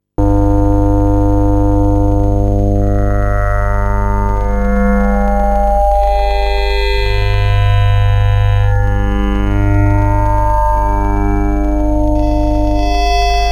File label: Wavetable Scan